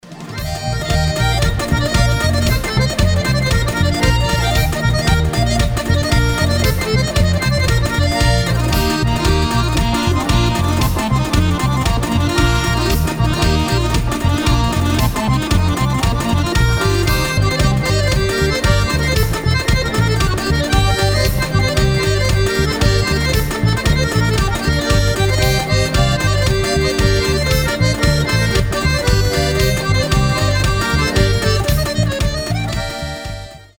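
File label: Dagestani lezginka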